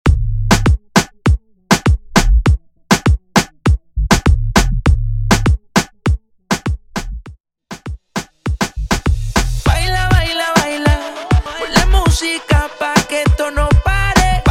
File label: Intro Break Outro 100 BPM